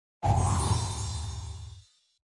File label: trap; baldursgate